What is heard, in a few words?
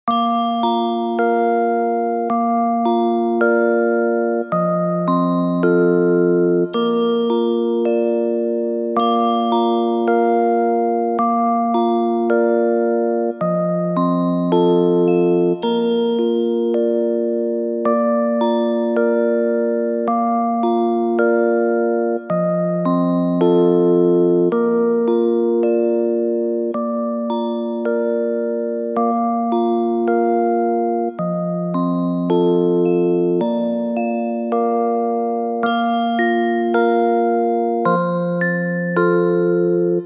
lullaby